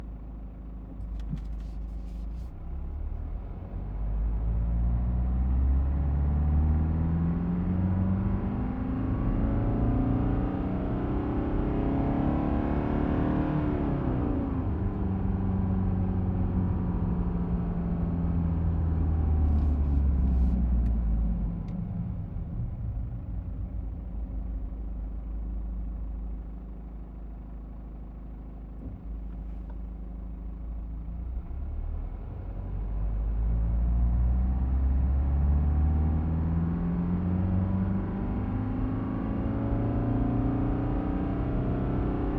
BMW 420D Gran Coupe engine RPM ramps interior mono; SoundHolder; 8040